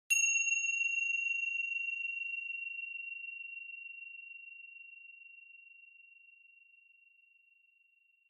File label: Finger Cymbals (Long)